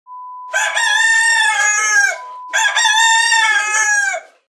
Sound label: Rooster sound